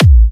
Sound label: Kicks A; markus hakala